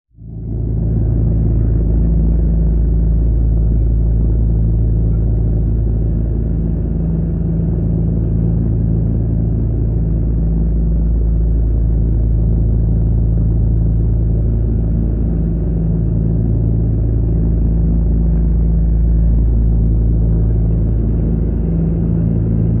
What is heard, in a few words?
AIRCRAFT PROP ANTIQUE QUAD ENGINE: INT: Steady in flight low drone.; ww2 fighters